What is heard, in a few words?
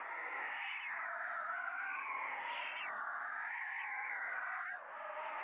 shade sibling idle loop; Hollow Knight